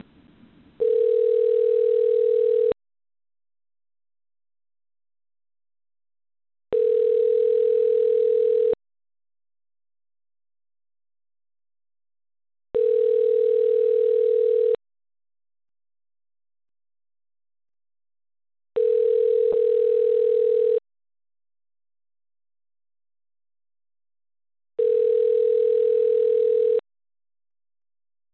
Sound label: Phone Call Tone